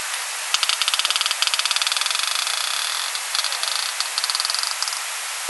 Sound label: Anoplopoma fimbria Rasp Tick High Variation